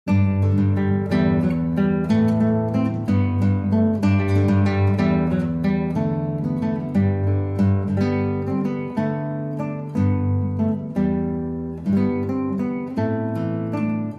Solo Guitar

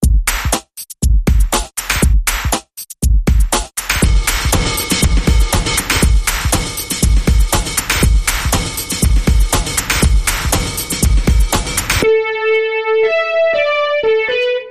Synthetic Dance Bumper Reversed

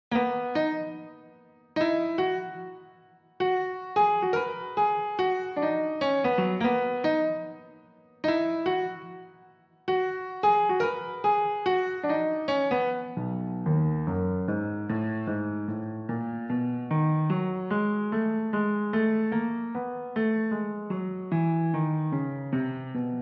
Piano Double Bass

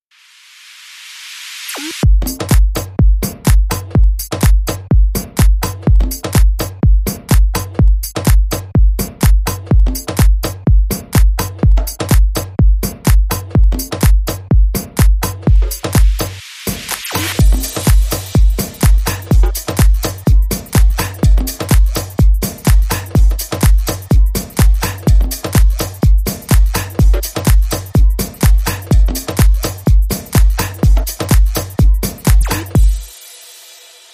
Default Beat

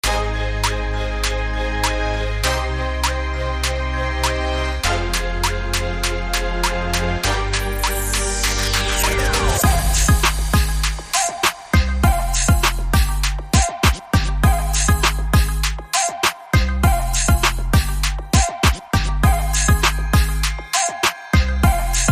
Mystical Trap

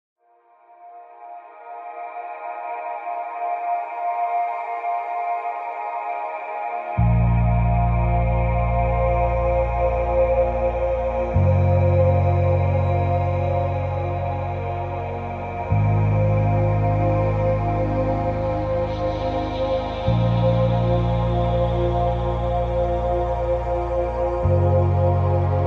No Glockenspiel No Synth Pads No Arpeggio Mix

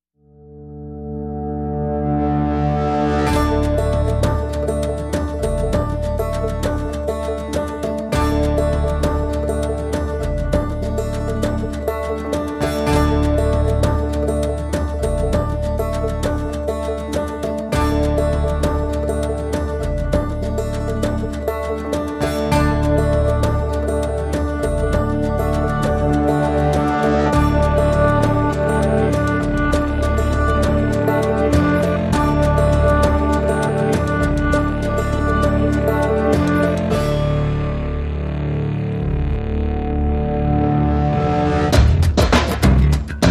No Guitar

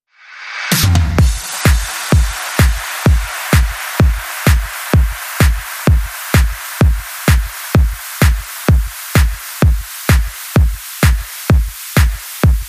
Beat

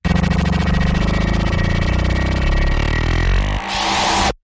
Glitch Hurried Stutter

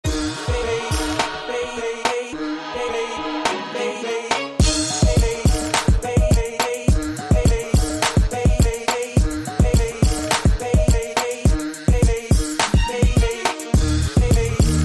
Trance

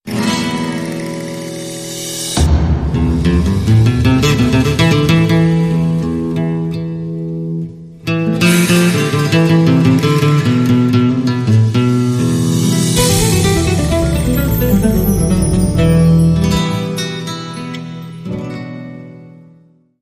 Spanish Guitar

